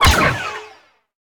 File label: blaster; naboo guard